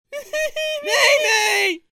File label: crying; "Nay Nay (Crying)!"